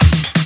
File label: Fill Ins 128BPM; Vengeance Samples; VEH2 Loops